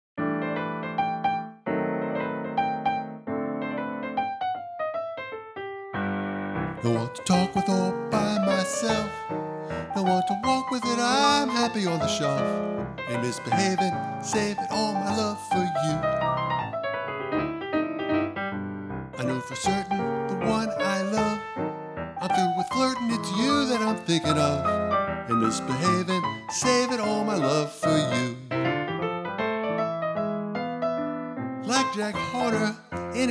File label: with vocal